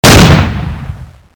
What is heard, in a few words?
spas12 shoot